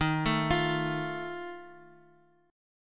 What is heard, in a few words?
D sharp Suspended 2nd Flat 5th D sharp Guitar; arpeggiated; JGuitar